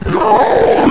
friendly alert; svencoop; portal house

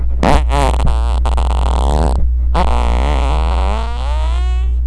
guy fart

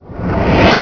pots

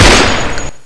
mgun, ambience; dod

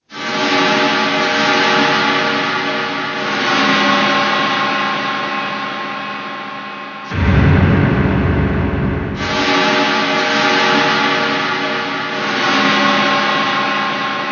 farm cymbal; svencoop; keenhalloween

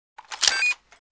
draw detonator; cstrike

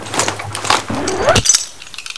armor on; svencoop; factions

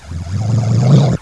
pit drone hunt; svencoop

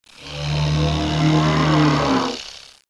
geneworm idle; svencoop

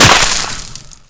gravel, debris

